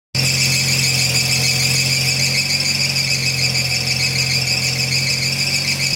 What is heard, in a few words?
sqealing sound belts pulleys only cold starts; 3rd generation 1993-2002